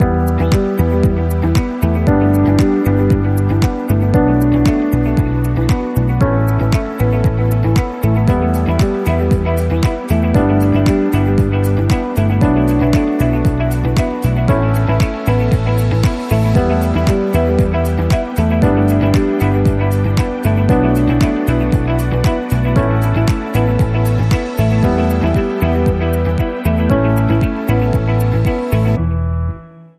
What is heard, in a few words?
Groove